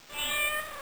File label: meow